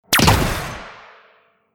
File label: fire, weapons; light rifle